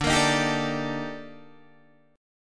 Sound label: Eb Major Sharp 11th D